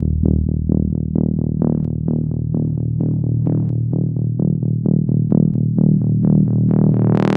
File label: Techno; Goldmine for Serum